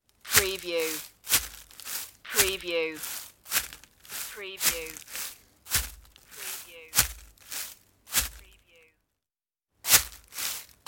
walking in the forest

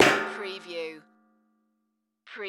Metal Folding Chair

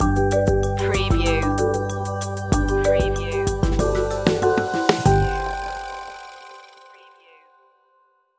feel good island stinger

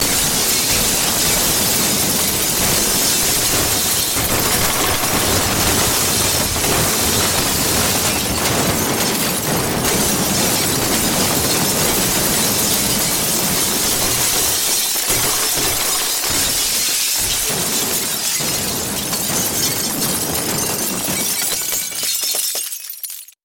Crash Wood Glass Long

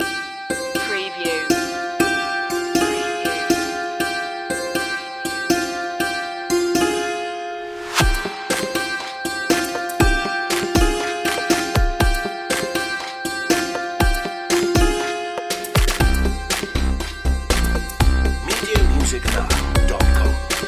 bollywood pop underscore

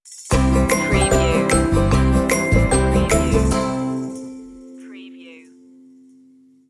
stinger

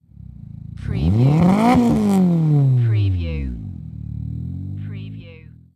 MITSUBISHI ECLIPSE EXHAUST SINGLE REV

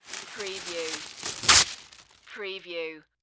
Wax Paper Tear Sheet; sound effects bible

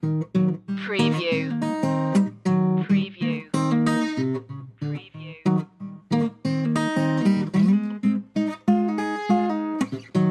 old town rag loop

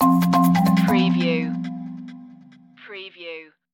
stinger

